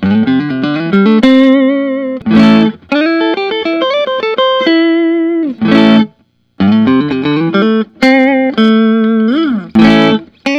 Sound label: fender custom shop 60 telecaster